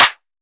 Ice Clap, Dubstep; Ghosthack - AC2024 - Day 13 - Dubstep Sounds 2025

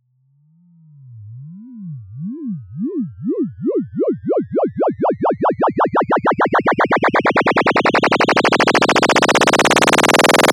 Riser Rubber Crush; Riddim; One-Shots; SFX; Ghosthack; Riddim Essentials Volume 2

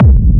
Techno Kick; 30 Techno Kicks 2025; Ghosthack

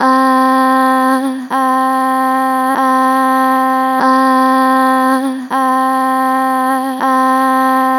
Str Vib Ahh, Vocals, Natural Vibrato Strong; Harmor Vocals

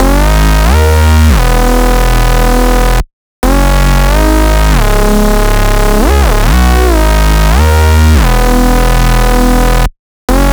140 BPM F# Min Bass, Speed House; Cymatics; GHOST (Beta Pack); Annihilate

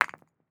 Inventory Material Stone UI; User Interface

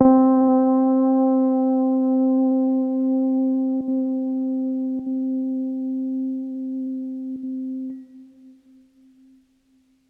Kit Keys C, Lo-Fi; HipHop; Melody Kits; One Shot; GhostHack; Ghosthack - AC2024 - Day 5 - Melody Kits; Gone 76 Bm

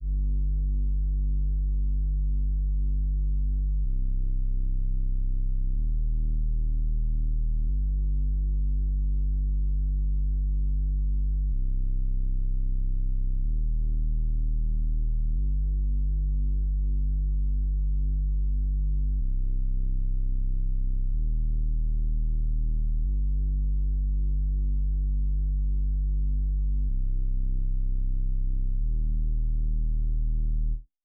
Acid Dream - 125 BPM G Min Bass; Melodies; Cymatics; GHOST (Beta Pack); Sunset

